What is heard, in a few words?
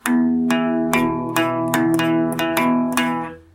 Guitar Phrase